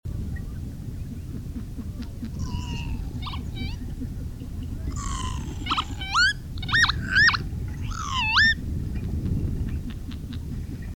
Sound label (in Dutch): Kievit Vanellus vanellus; lente